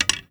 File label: Arrow Nock; timelock vr